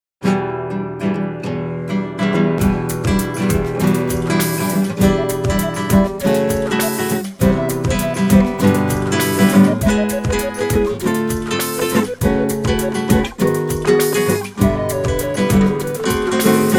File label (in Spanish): Variación con guitarra